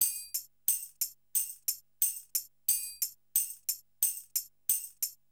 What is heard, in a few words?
Dholki Loops